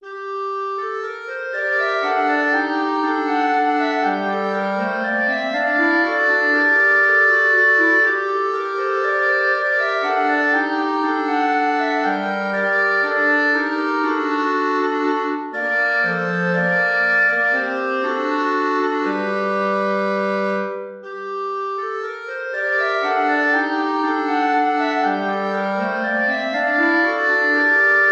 3 Klarinetten in Bb; Raggi dov' e'l mio bene

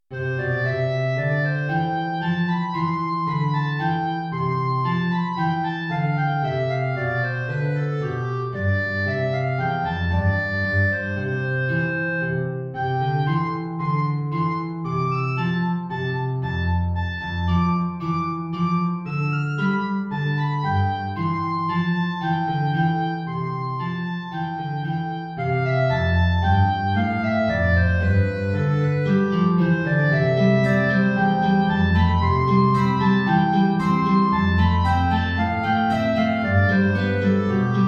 Air, Klarinette in Bb & Gitarre, hoch; BWV 212-24

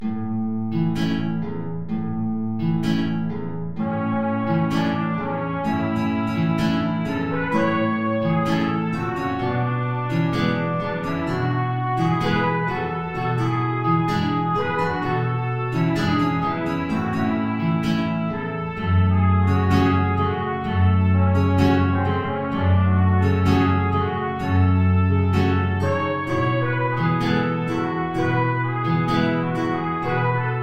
Tango Trompete in Bb 2 Gitarren tief

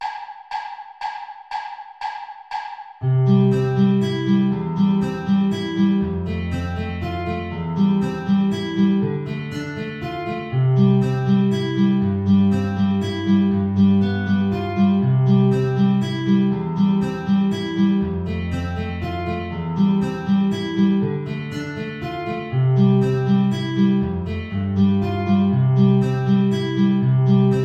Menuet Altflöte Gitarre hohe Notierung Play-along; BWV Anh 114

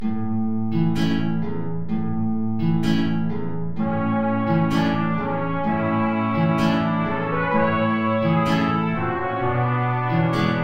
Tango 2 Trompeten in C Gitarre tief

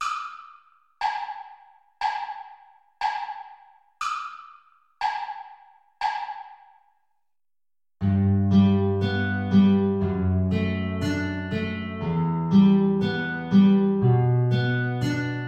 Sopranflöte & Gitarre Play-along; Down by the Salley Gardens

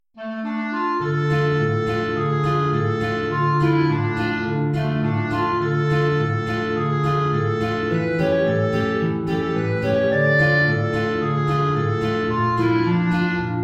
Klarinette in Bb & Gitarre, tief

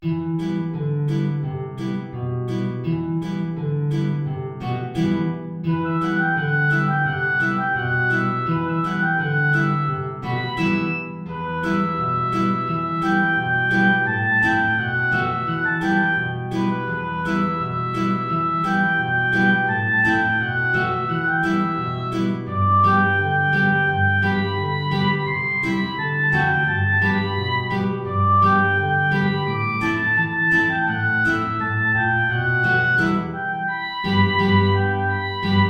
Oboe & Gitarre, hoch; Chiribim Chiribom

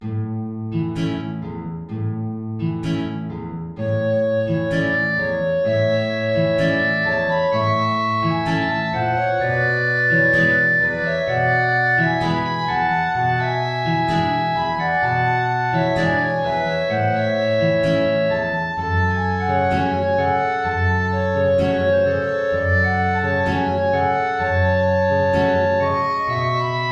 Tango, 2 Klarinetten in Bb & Gitarre, hoch

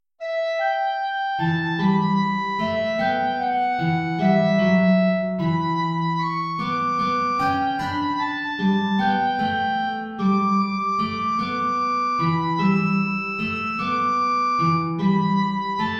Klarinette in Bb & Gitarre (hoch); O Heiland, reiß die Himmel auf

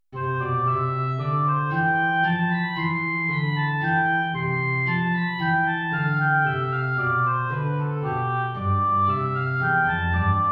Air, Oboe & Gitarre; BWV 212-24

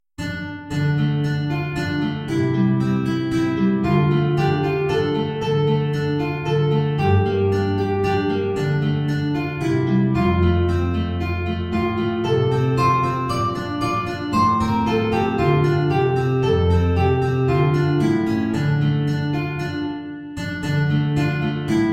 2 Gitarren; Es kommt ein Schiff, geladen

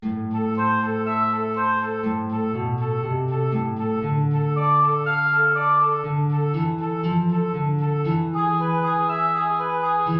Allegro (Oboe & Gitarre) (tief)

